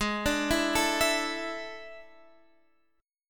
A Major 7th Suspended 4th Ab

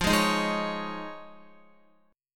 F Minor 7th Sharp 5th Guitar; Fm7#5 strummed; x,8,8,8,8,9; 4F,4A,5C,5G,5C,6E

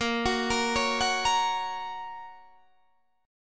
A sharp Minor Sharp 5th, Guitar Standard; arpeggiated; JGuitar

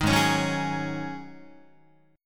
G sharp 7th Suspended 4th G sharp, Guitar; G#7sus4; 6,4,4,4,5,7; strummed

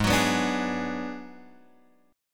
Ab Minor 7th Flat 5th, Guitar, Open F; strummed; JGuitar

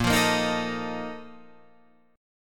Bb Major 7th Suspended 4th, Guitar, Drop D; 8,8,8,8,10,11

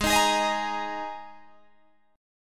Ab Suspended 2nd Guitar Drop D

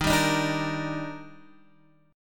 D sharp Major 7th, Guitar DADGAD

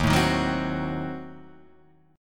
D sharp Diminished 7th, Guitar, Half Step Down

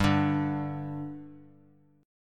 G 5th G, chord; G5 strummed

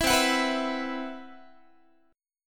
C Major Sharp 11th, C, down, Guitar; CM#11; strummed; 19,20,21,21,20,x; 4A,4E,5A,5D,5G#,6C#

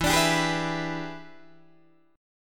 E 7th Sharp 9th Guitar Open D, chord

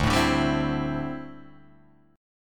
D 9th Suspended 4th D down Guitar Drop D

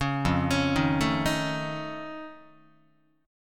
F Augmented 7th F Guitar, chord; F+7 arpeggiated; JGuitar

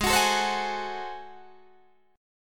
Ab 7th Flat 9th Guitar Open D, chord

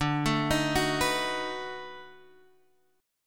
B 7th D; B7/D arpeggiated; JGuitar

